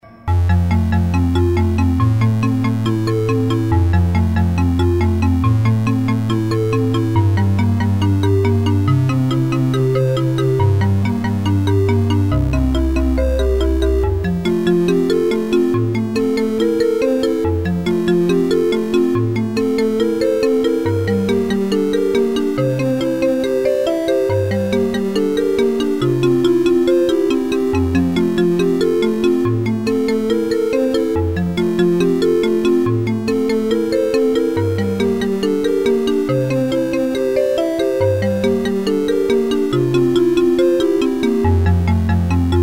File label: Classic Vibraphone